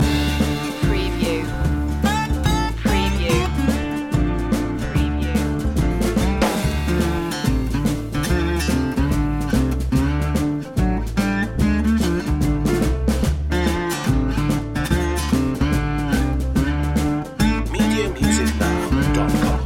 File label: apocalypse blues loop